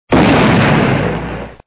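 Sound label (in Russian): взрыв во время боя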